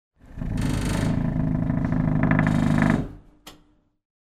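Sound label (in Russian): индустриальные ворота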